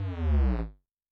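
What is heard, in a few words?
jungle bass; Yamaha DTXpress-II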